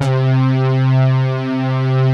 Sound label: SYN BRASS; Pro-Rec - Synthsonic